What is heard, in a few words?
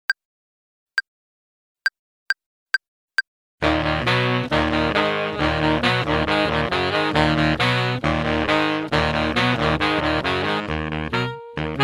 playalong alto